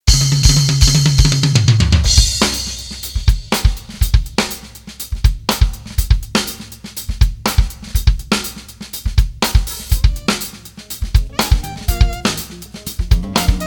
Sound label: fusion